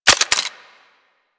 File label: Revolver Pistol Reload Eject